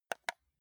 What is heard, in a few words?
console controller home press slow